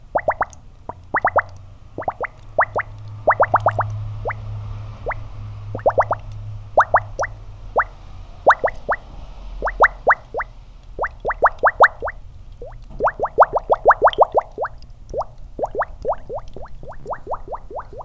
Shallow Slowish

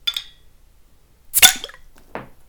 Opening Bottle

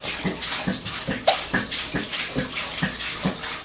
carboy pour

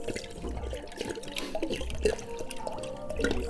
bubbles

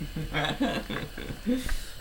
hhhahahahahhu

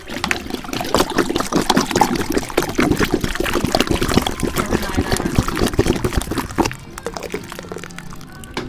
mono glug

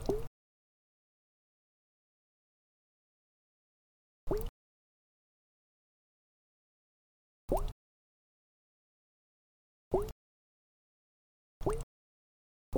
building bubbles

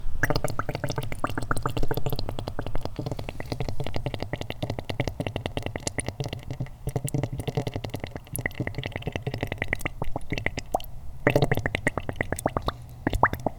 intense very shallow

